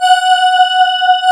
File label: CMI ORGAN